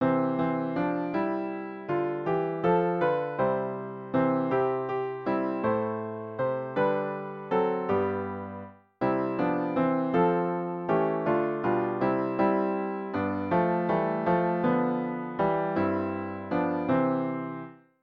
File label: Piano